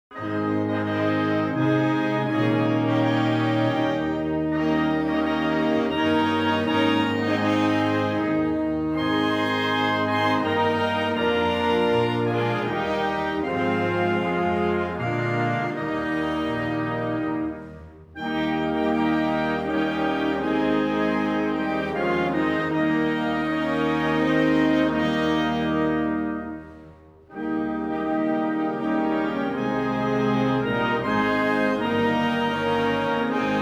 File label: Switzerland - Patriotic Song, Orchestra; The National Anthems